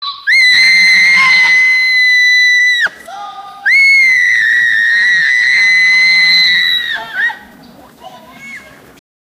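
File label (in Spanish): niños de buenos aires, anger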